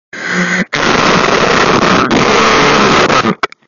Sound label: screaming